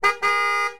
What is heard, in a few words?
car horn